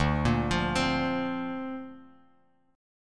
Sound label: D-Minor 7th Sharp 5th D Bass Standard, chord; Dm7#5; arpeggiated